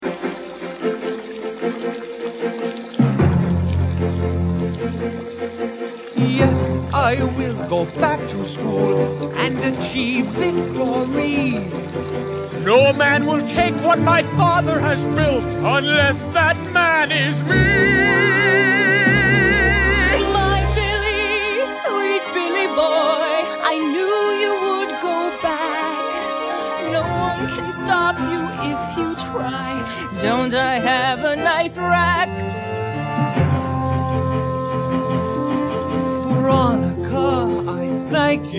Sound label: victory song